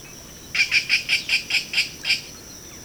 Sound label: kak, birdCalls; National Marsh Bird